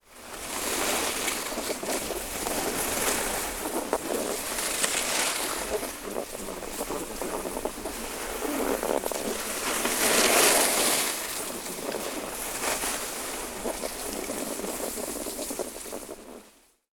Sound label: Skiing